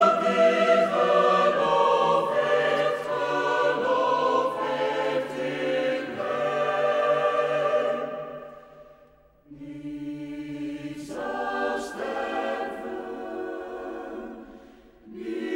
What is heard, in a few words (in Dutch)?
Koor gemengd; Amsterdam Stafkoor